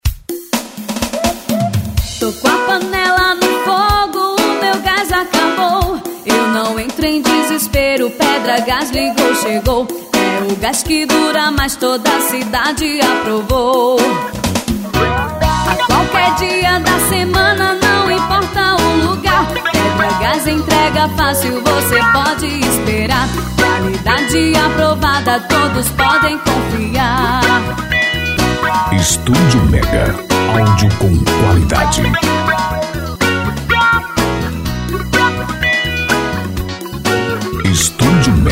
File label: JINGLE; PEDRA GÁS